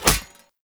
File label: Throwing Knives Impact Armour; Weapon Impacts; Player; Combat